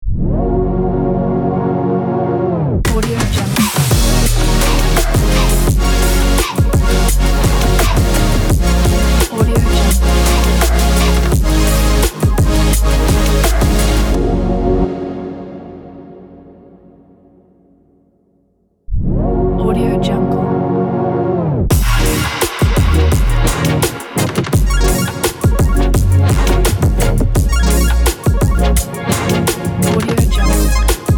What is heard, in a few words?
future bass intro and outro